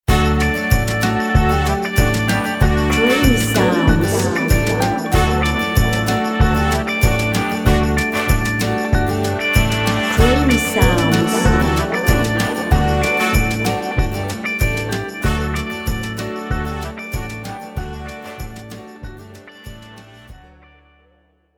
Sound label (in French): creation musicale country music